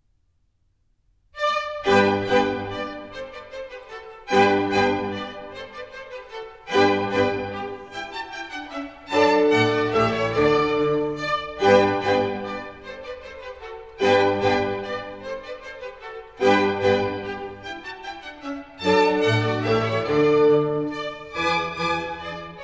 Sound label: Minuets, G major; Mozart; Dances and Marches